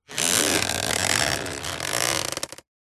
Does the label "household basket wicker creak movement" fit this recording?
Yes